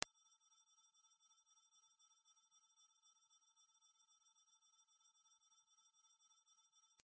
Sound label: vortex, mosquito ringtones